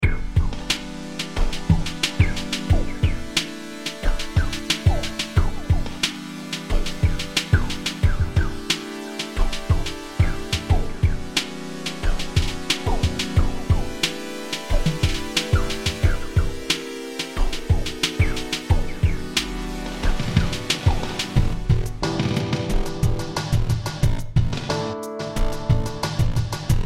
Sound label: Em90 Groove